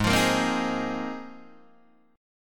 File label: Ab 6th Add 9th Ab, Guitar, Open D